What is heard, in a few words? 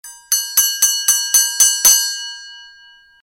message notification